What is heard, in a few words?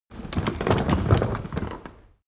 cart medieval drag slow short, kutsche; verkehr transport